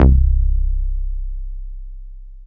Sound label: BSE C, BASSES, House